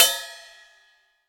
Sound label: big ride cymbal sound f sharp key